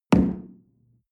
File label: coffee place tablecloth medium, restaurant cafe